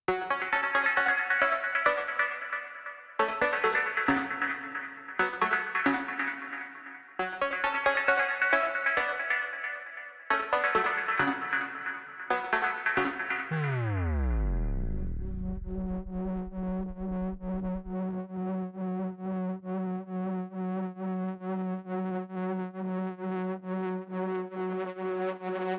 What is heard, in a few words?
Duppy Steppin Ambient Version, dub